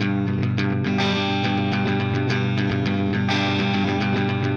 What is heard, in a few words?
Clean Funk Guitar